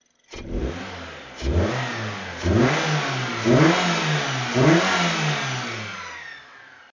Mazda2 Intake sound; AutoExe

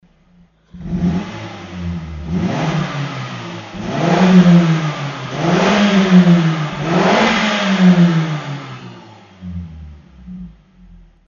Mazda8 MPV LY NA, Muffler; AutoExe

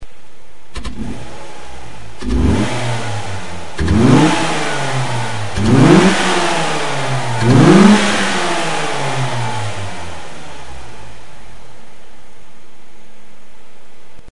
Mazda5 Intake sound; AutoExe

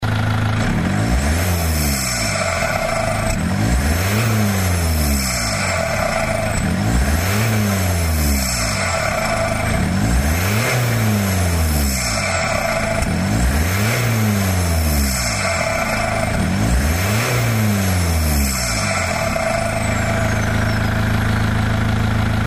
Mazda3 Intake; BM; AutoExe

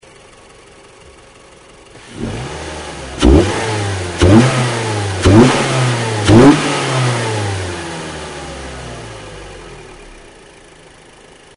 Mazda3 Intake sound; AutoExe

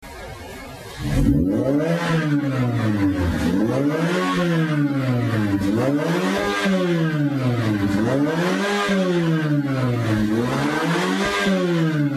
Mazda6 Atenza GJ, Muffler; AutoExe

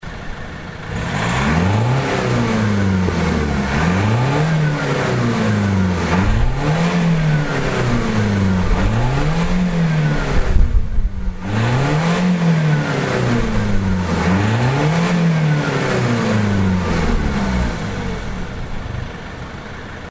Mazda2 Demio DJ, Muffler; AutoExe

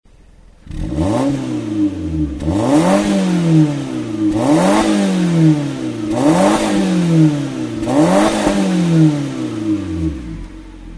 Muffler, Mazda3 Axela; AutoExe

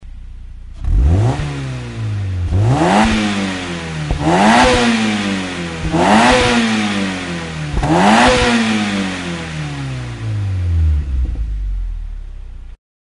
MX5 Muffler Audio; AutoExe

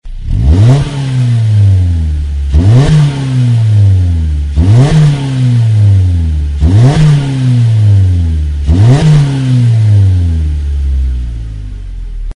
Mazda3 Axela, Muffler; AutoExe

